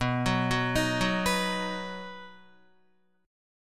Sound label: B Major, Guitar, 4F,4A,5C,5G,5C,6E; arpeggiated; JGuitar